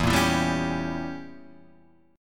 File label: E Diminished 7th, Guitar; Edim7 strummed; JGuitar